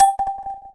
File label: hint